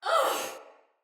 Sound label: Death Finisher Soldier Female; Battle; Barks; Dialog; Sinecure Soldier Female B